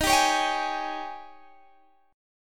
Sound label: D sharp 11th D sharp, Guitar, Open G